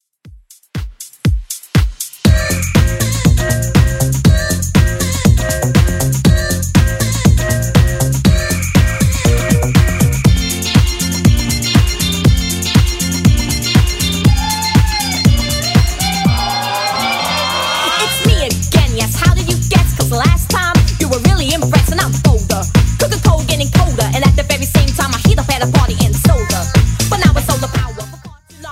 90s Redrum 120bpm

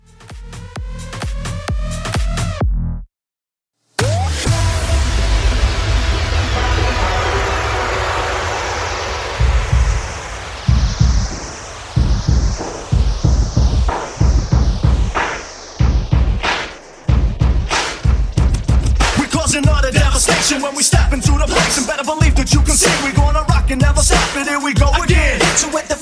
Hands Up Quick 130 DIRTY

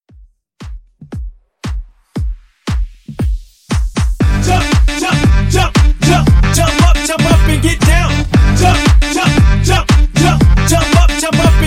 Hype; Remixfreakz; Redrum

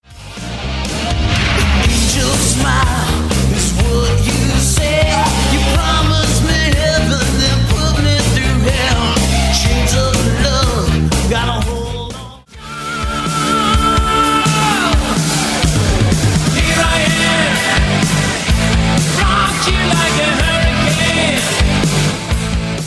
80s Pop Rock Megamix

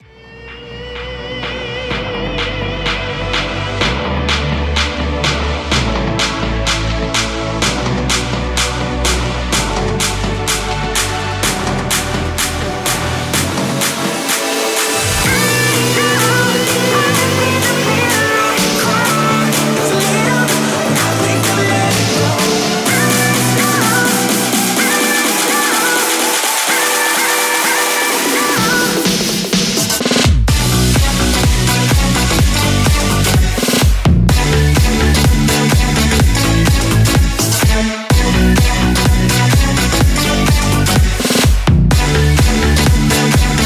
Festival 126Bpm

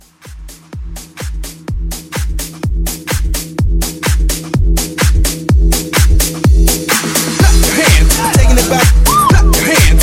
Club House Edit 126

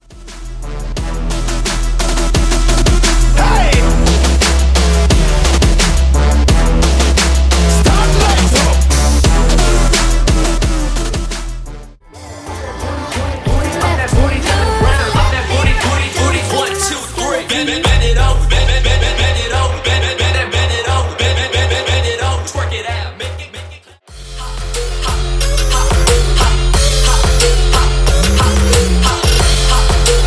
Dubstep Trap Megamix; Remixfreakz

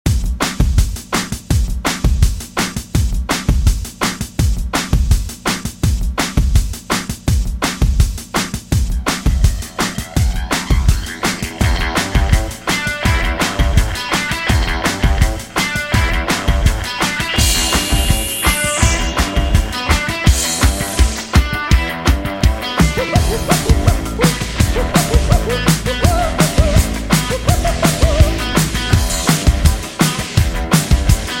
80s Dj Intro Outro 166Bpm

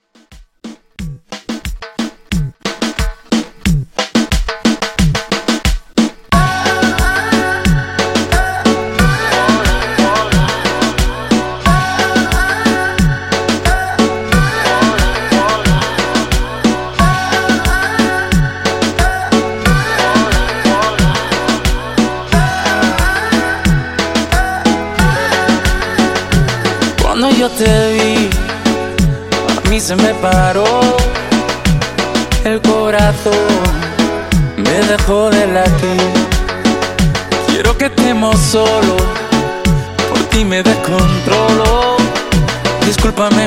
Reggaeton 90 Bpm

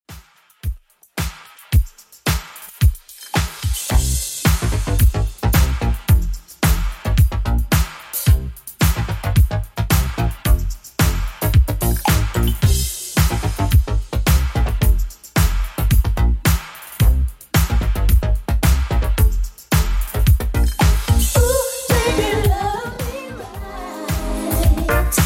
80s Redrum 110bpm

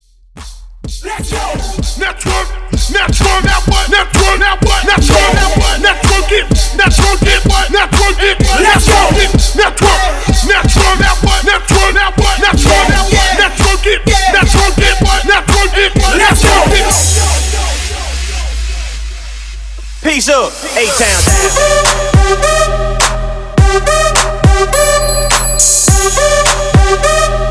Club Twerk Quick Transition 129 105 BPM; remixplanet

